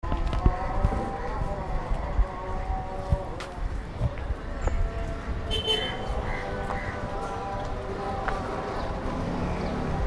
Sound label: mosques, South India street recordings